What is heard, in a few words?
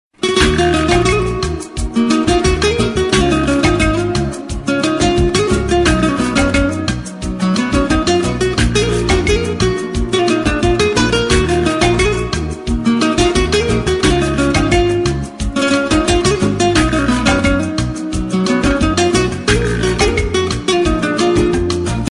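romanticnaa gitara, romantic guitar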